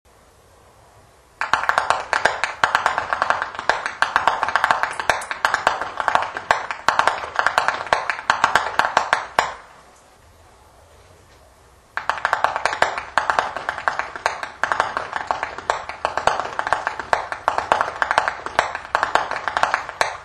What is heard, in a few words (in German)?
schnalzen, flachgau; anthering